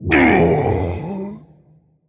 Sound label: male celestial, Death